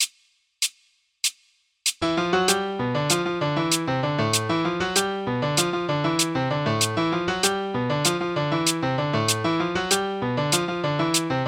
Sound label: changui fast